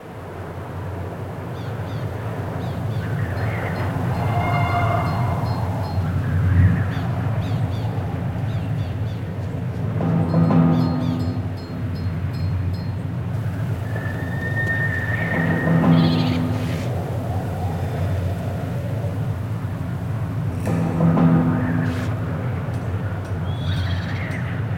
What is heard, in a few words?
main theme intensity Level; conan